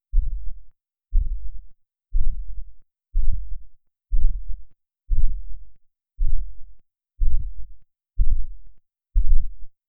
tense ticking heartbeat

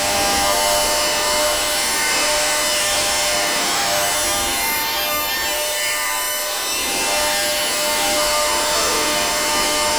money count noisy pixelat

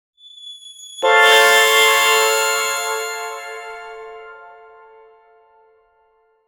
a mellow yet bright jazz, celestial

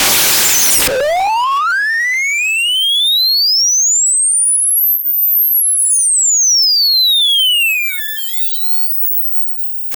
short exciting, objective

